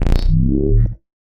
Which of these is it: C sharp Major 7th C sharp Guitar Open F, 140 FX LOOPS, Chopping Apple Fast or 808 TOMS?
140 FX LOOPS